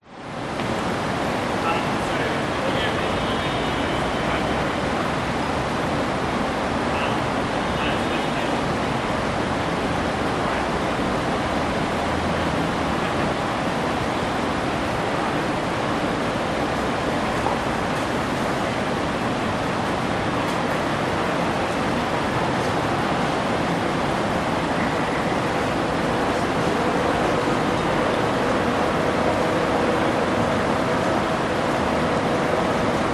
public places city few people walk by fountain close dist pedestrian crossing; city hum